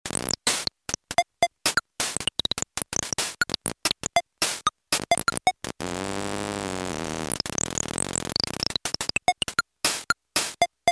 rhythm hyperdrive

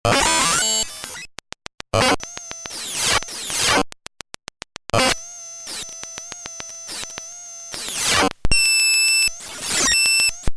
theremin; e t phone fibre optic theremin control

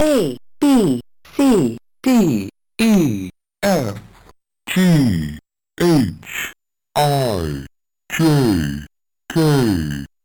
pitch down; ohmegalpha

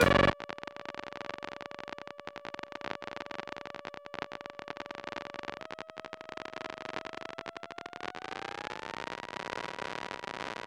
pitch loop

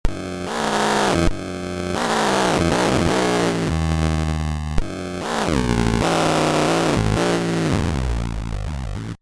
alien first words faux wildcat

